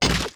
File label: Ghost Attack Shield